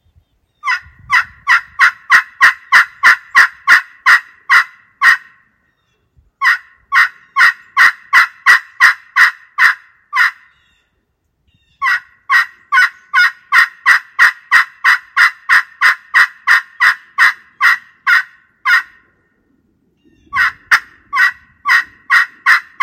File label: Walnut Anodized Aluminum, turkey calls